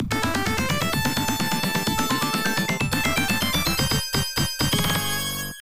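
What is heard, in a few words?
background music; Street Fighter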